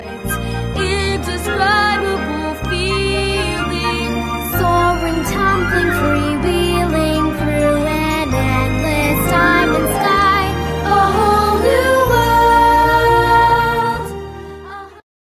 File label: Guide Vocal; aladdinkids